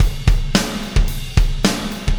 Beat, Processed Drumkits

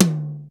DRY T, drum; Crypton; DrumBase5000

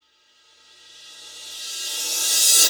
Reverse Cymbal

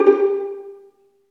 String Vlns Pizz

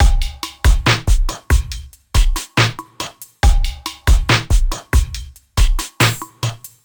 SloMo Beats, Loops 140 BPM

